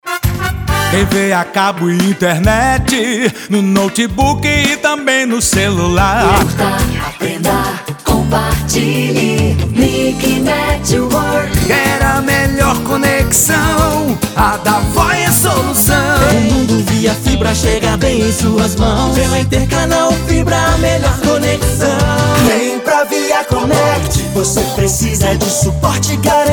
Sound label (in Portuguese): jingles internet; mundi internet